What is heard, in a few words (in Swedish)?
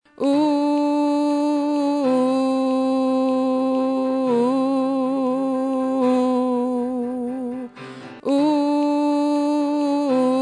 alt, stammor